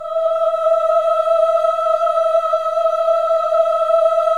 VIB M E5B -R, Symphony of Voices; Spectrasonics

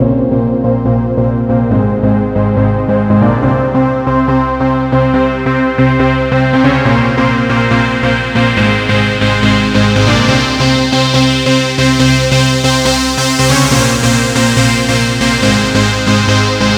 NRG Sweep, Trance

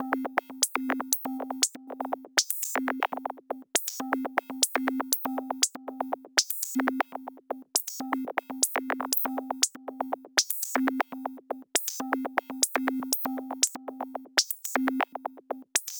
Noise, LFO & Noise

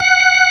ORG VK Org F6#; Best Service Gigapack 2

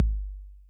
Kick Accent Max Tone Max Decay, 808

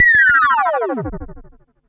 Perc Synth Moog Delay

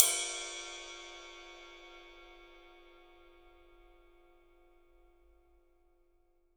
ride cymbal; 3D Sound; 3D Drums

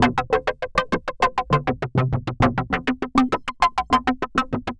synth 100 trasharps C; Transmission-X

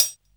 HH, Dance Drums; Best Service; Dance Mega Drums

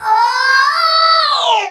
VOC L, Drum & Bass 140 BPM; Ueberschall; Drum & Bass Flash Trackz

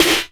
SD HOU, Dance Mega Drums; Best Service

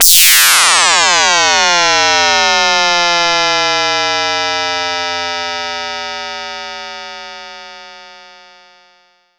SYNTH FX

